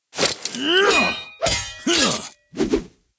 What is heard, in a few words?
SFX 3D Sword Spattack; Puzzle Chronicles